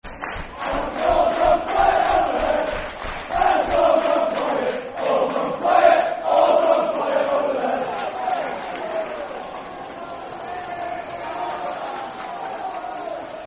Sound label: Football Chant, Soccer Song; And It's All Gone Quiet; Stockport County Scfc